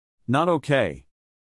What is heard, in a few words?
notok